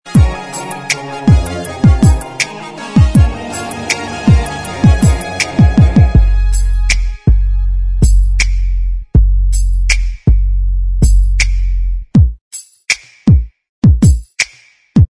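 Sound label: instrumental, backing track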